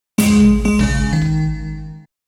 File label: Game over lose; gfxsounds